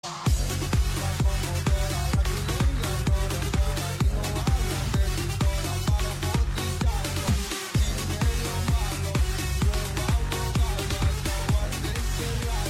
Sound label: motorola dance